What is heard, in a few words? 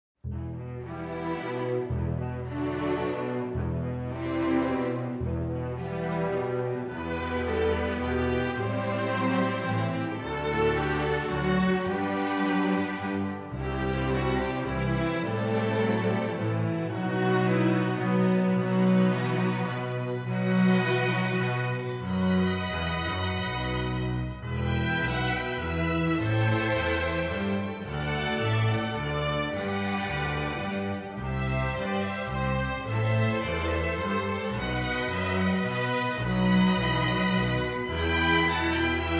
Fantasia On Ukrainian Bell Carol, Orchestra; Lakeside HS Orchestra